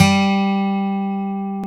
Gtr Acc; CMI

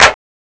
CLAP, CMI

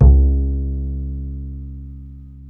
Bass Acc; CMI

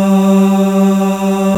Choir; CMI

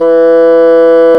Bassoon; CMI

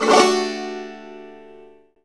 Banjo Strum C6; CMI

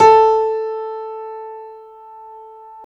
Piano Grand; CMI

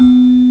GONG, CMI

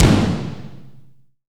Kit Heavy KICK; CMI

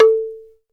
Bamboo; Shinachiku; CMI

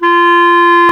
Clarinet; CMI

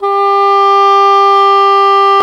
Cor anglais; CMI

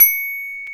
Glock; CMI

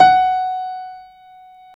Piano Grand; CMI

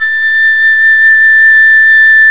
Flute; CMI

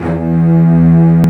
Cellos; CMI

